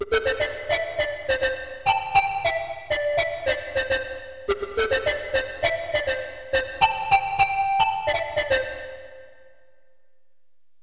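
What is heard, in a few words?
bottle blow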